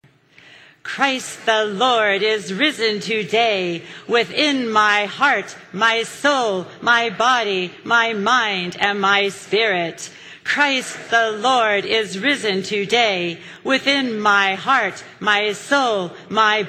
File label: Mantra 3x; Easter Meditation Ritual